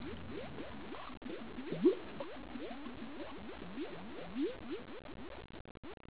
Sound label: mud; Golden Record